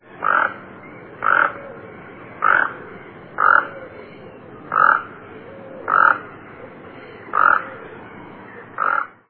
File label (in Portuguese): tucanucu